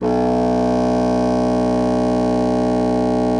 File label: B1, bassoon; Xsample VOL-6